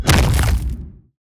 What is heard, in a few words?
Armor Break; Damage